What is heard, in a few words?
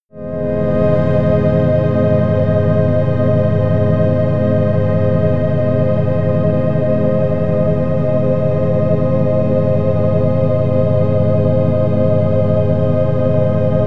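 hallow air, pads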